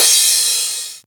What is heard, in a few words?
Crash, Cymbals; Vengeance Samples; VEH2